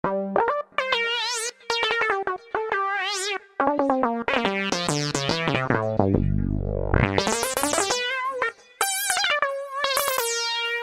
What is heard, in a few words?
Mini LEAD